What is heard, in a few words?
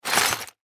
Junk Drawer Objects Push To Side Single Movement; Kitchen Sound Effects; Foley